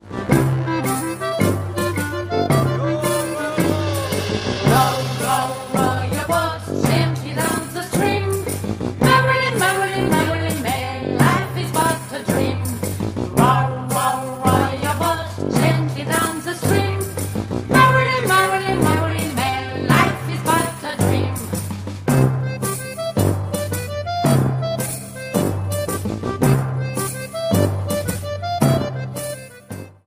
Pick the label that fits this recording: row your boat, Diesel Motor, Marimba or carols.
row your boat